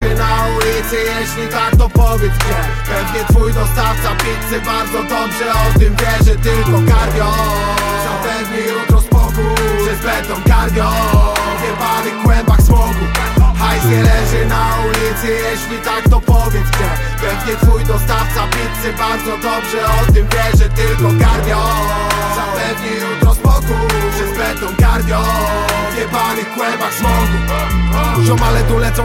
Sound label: cardio